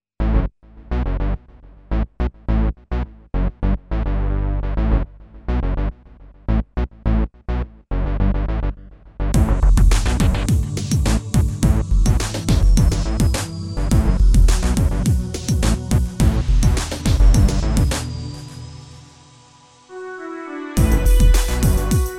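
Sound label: groove; sy85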